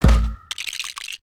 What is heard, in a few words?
cavalry on death; creatures organic